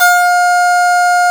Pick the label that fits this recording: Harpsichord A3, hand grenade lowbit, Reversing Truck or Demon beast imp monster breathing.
Harpsichord A3